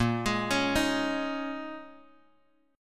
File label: Bb Minor 11th Bb down Bass Standard; arpeggiated; JGuitar